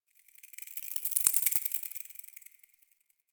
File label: Foliage Insect; Warframe; Duviri